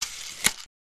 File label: slide closed